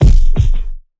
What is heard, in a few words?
Punching Perc, Bag Drop; 99Sounds; Punching Percussion